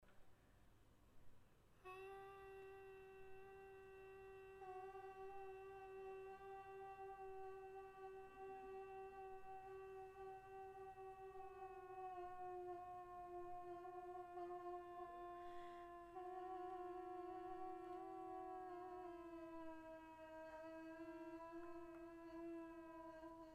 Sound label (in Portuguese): duo voz e violino